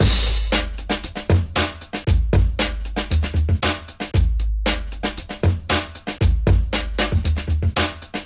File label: Drums, breakbeats; Loose Foot; Ableton; Breakbeats by KutMasta Kurt